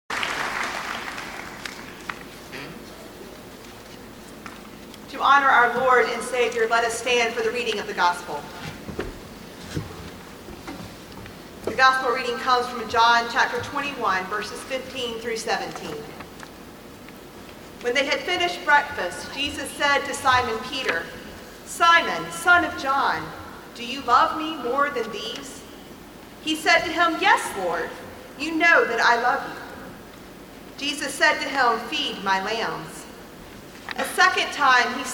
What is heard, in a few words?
Choir